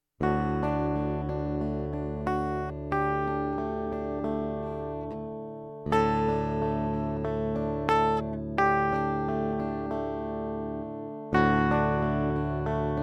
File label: hexaphonic; ken lusk